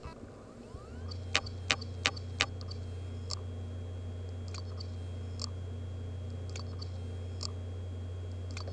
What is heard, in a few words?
hd sounds, laptop, bad; mac disasters